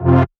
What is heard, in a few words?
Synth Cuts; Instruments; VES1 Synth Cuts